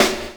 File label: SD, snare, 70 s Breakbeats; USB Soundscan vol.46; AKAI